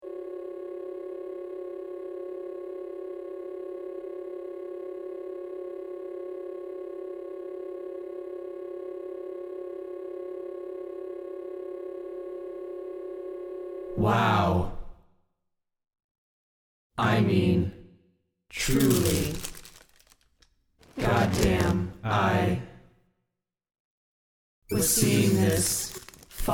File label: MAGICICADA BROOD XIII